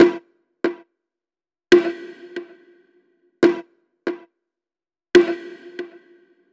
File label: Outer Bongos 140bpm; Loops; Drums; Percussion; Ableton Live 12 Lite; Core Library